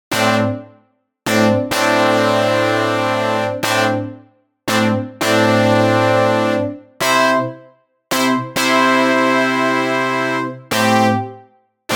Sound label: Brass; Cherry Audio GX-80; GX Brass 1